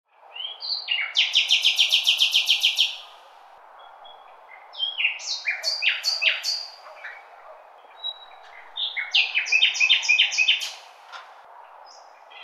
ringtone birds song

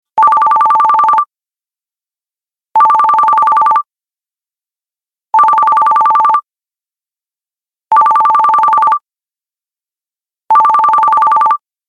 bell ringtone

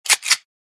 gun cocking ringtone

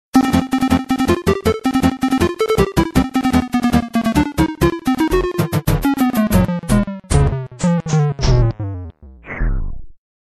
battery low ringtone

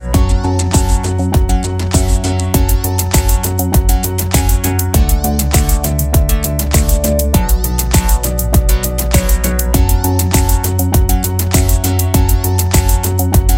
joyful ringtone

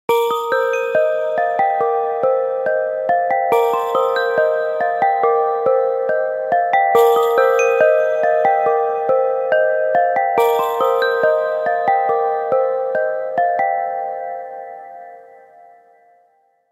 gentle morning alarm ringtone

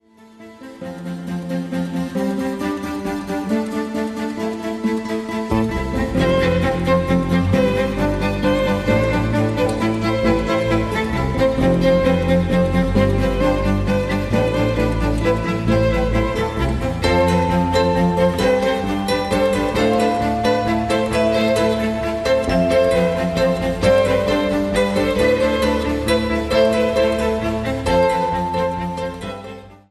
ringtone

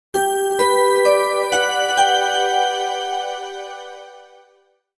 xmas sms alert tone

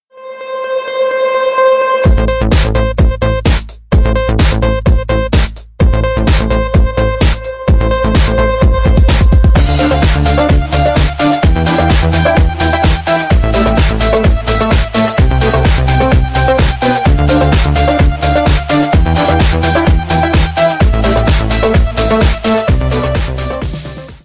techno ringtone

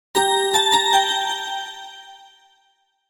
message tone melody

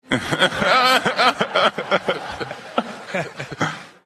laugh ringtone; kawhi leonard

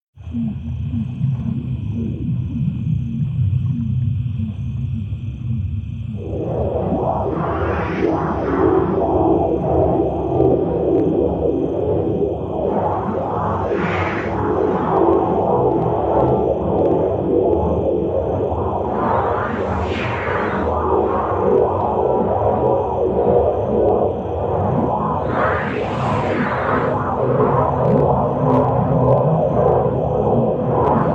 dark noise ringtone

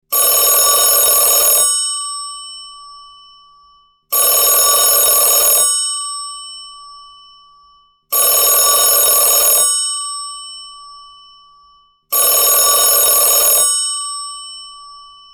old fashioned ringing phone

